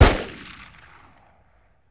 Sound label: Pistol Shot With Echo; Sony Pictures Sound Effects Series Volumes 1-10; Sony Creative Software